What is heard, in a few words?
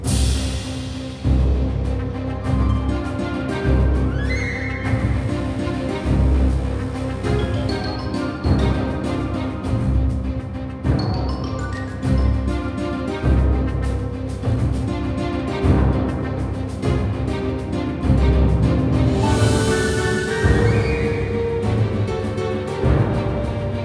dungeon; Fate The Traitor Soul